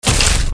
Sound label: hit; freak fortress 2; isaac tboi